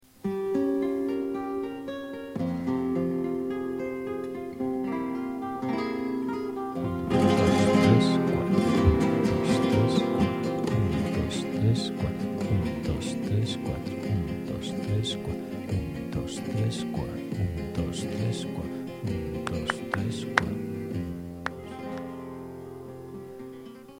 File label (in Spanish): farruca